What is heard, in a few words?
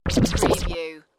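DJ Scratch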